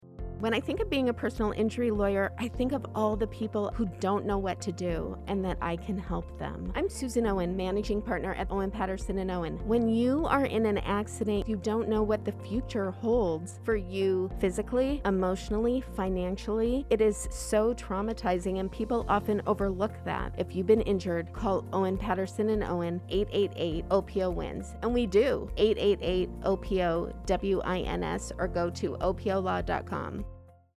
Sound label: truamatizing music bed